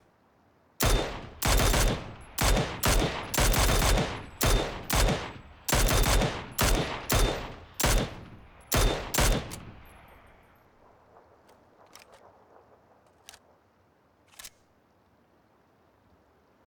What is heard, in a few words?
Mini-14 shots